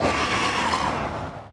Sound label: fireball voice